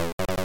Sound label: Ammo Pick Up; Wolf3D